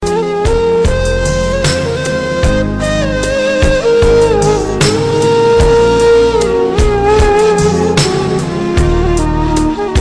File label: Best Turkish Music Ringtone